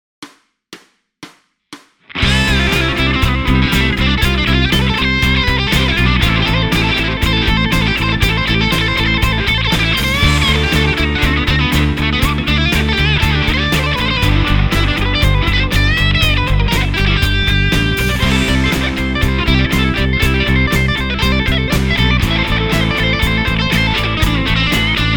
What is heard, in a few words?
adrib very bad